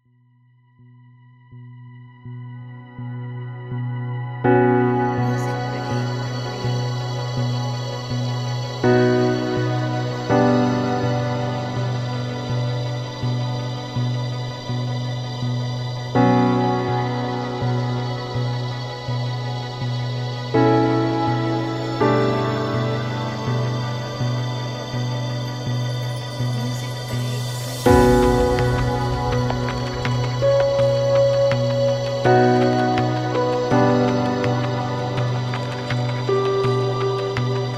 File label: Ambient Piano Space Soundscape